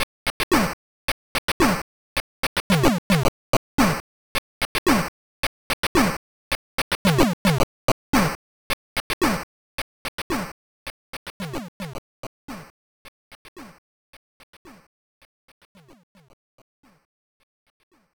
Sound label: Main Music; Woody Pop Game Gear